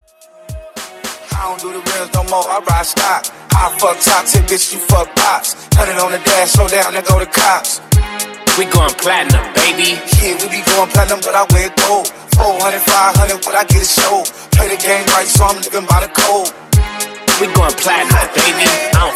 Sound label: Top 40s Re Drum Up Tempo 109 BPM